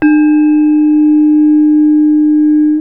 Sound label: gangsa lo, gamelan; gravityharps